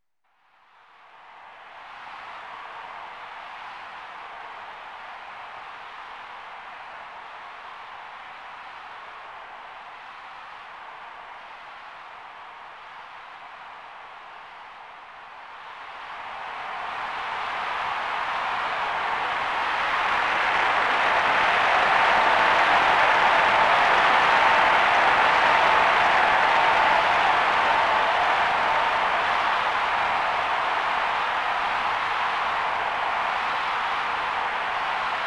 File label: Crowd Noise; Wave Race 64